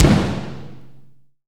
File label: Kit Heavy kick; CMI